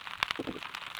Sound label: grunts, soniferous; Gilligans Island